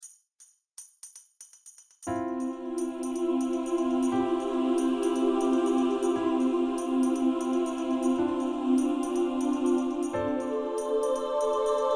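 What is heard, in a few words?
keyboard inspired, instrumentals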